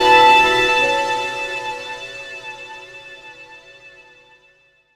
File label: Dream Brass; Jungle; Blu Mar Ten - Jungle Jungle - 1989 to 1999 Samplepack